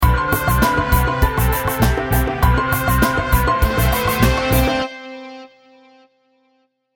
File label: jingle